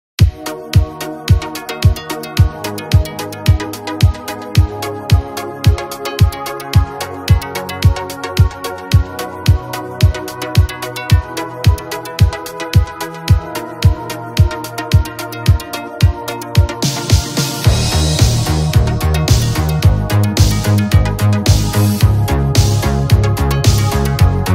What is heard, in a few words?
style 80s music disco